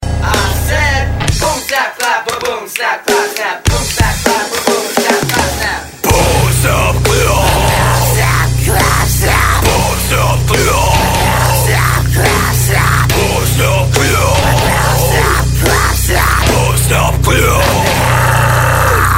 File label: boom clap